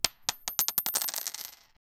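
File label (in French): bruit de pièces d'or, treasure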